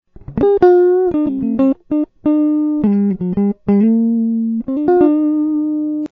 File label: guitar